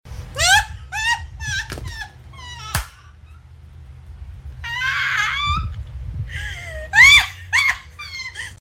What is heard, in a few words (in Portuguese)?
rindo, risada kkkkkkkk